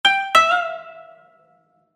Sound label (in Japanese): 場面切り替え 琴 和風